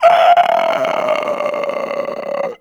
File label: Infested Runner Death; Warframe; Runners